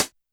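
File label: Hi-Hat Closed Hard H; YAMAHA RM1X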